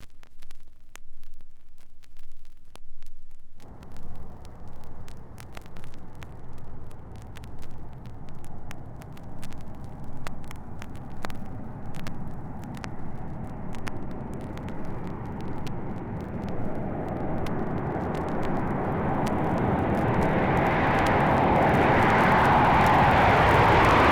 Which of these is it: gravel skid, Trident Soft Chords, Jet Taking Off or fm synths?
Jet Taking Off